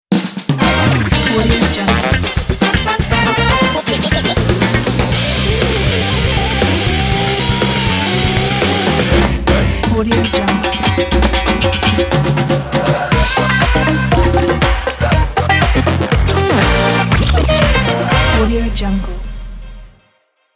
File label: intro music festival ident